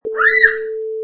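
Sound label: Child Quick Scream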